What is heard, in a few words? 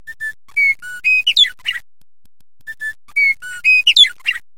Western Meadowlark call